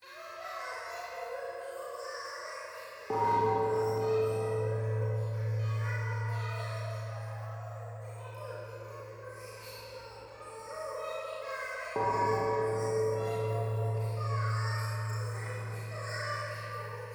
廃墟 可愛い喘ぎ 神社

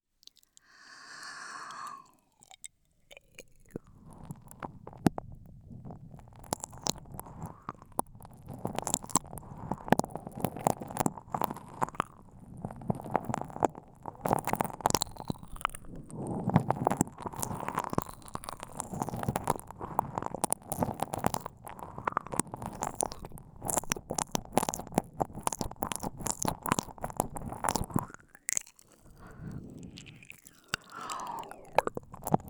耳舐め, ASMR; SEあり

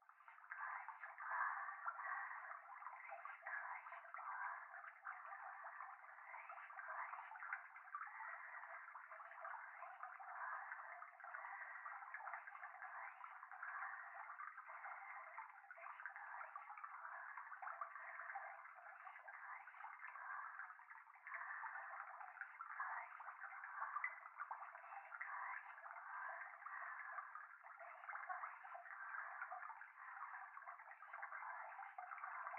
風呂 しこしこ 川のせせらぎ

